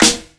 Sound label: short acoustic snare a sharp key; snare drum samples